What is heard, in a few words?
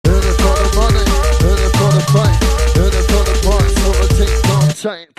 Drum and Bass Jungle, UK Bass Radio